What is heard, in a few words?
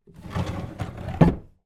office wooden file drawer slide close